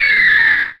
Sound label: gryphon hit; wesnoth